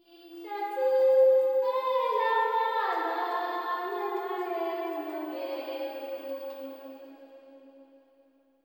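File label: LITTLE GIRL, AFR. GIRL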